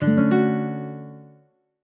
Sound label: LG Cable Connect Acoustic; LG K83 5G